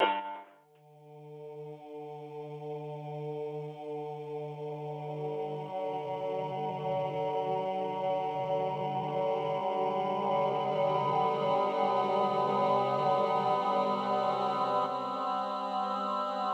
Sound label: synth